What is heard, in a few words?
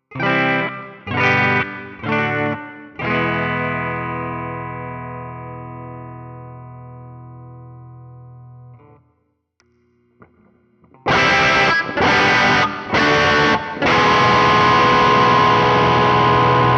Crunch Box Dist, guitar